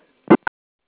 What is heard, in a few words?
dtmf blip